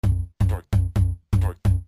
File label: loop